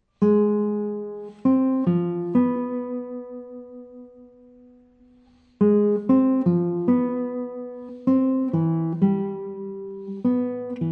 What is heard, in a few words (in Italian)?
Recitativo